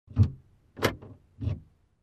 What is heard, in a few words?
Opening and Closing compartment in car